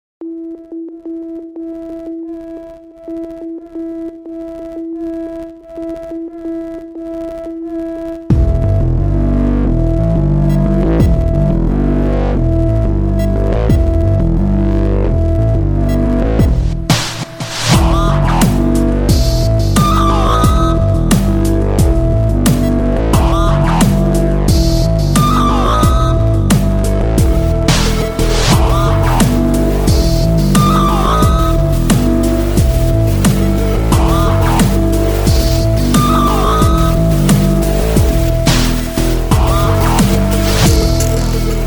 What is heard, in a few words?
Pulsing Electronic; CSI Miami